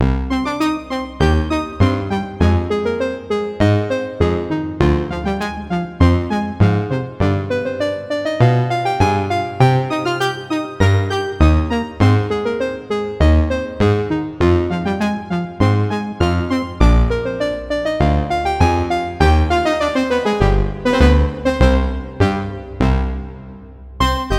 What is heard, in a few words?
Choose Music; Earwax; Jackbox Games; The Jackbox Party Pack 2